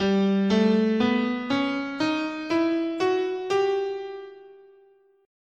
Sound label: G Lydian Augmented, scale